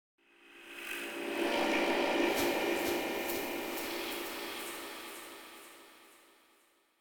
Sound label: Metallic Echo Buzz; Hollywood Edge; sound designer's toolkit v2